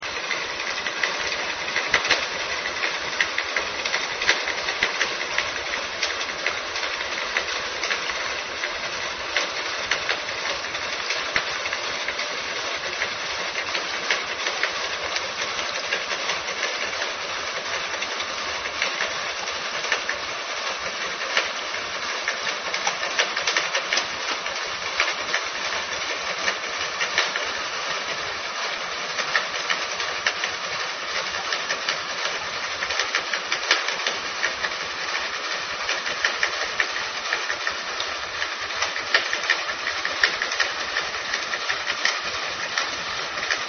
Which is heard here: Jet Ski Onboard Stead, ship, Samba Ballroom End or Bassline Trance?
ship